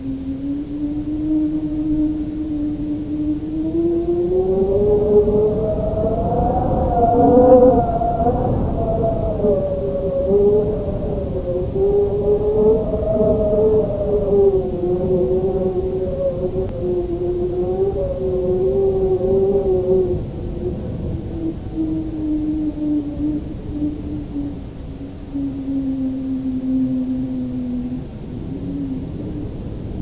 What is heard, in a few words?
chamber